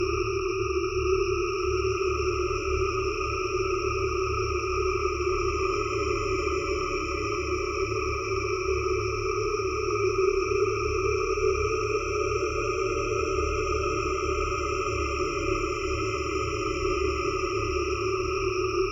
Eerie ambience

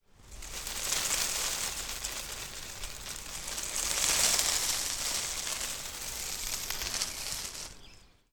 Leaves

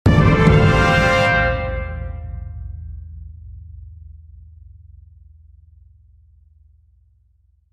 Victory Trumpet And French Horn

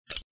Clink

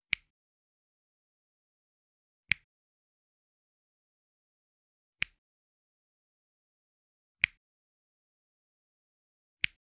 Small Electrical Appliance Switch

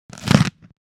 Crunch

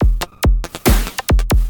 Attack Loop 140 Bpm

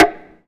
Wood Block

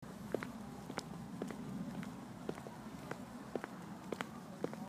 Walking

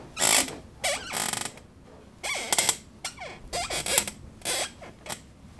Metal Chair Crackle Creak

